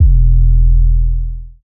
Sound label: 808 E; Cymatics; Oracle Sample Pack; 808s